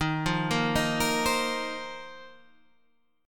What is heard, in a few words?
Bb Suspended 2nd Suspended 4th Eb